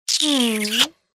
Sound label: Female vocalization with kiss sound